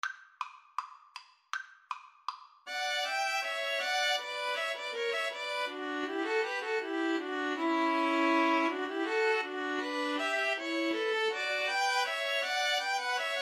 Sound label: bourree, String trio